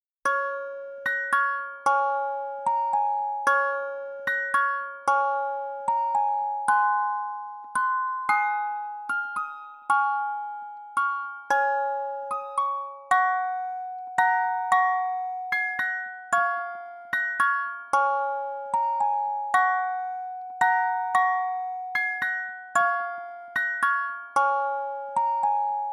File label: silent night christmas music box